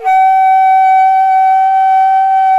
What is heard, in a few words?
G4B, tarogato; SoundBytes - Early Patches